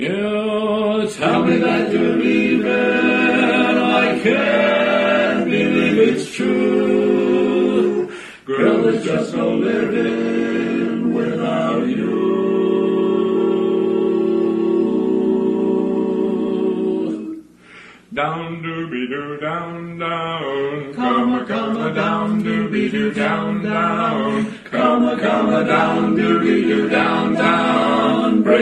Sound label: Barbershop Quartet